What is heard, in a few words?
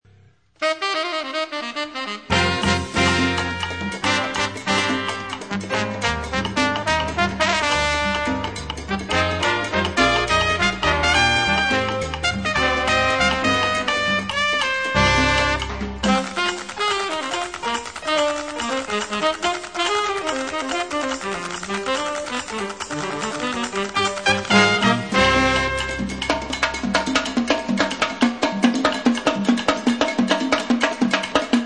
Jazz